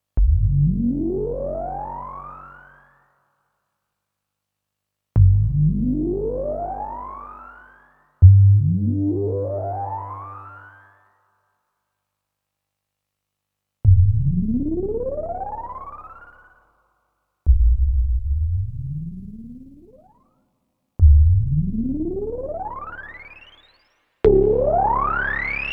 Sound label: Bm screwdriver; Crave 80